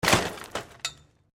Bicycle Mountain Bike Crash Metal Pole, Bike being thrown around to simulate crash impacts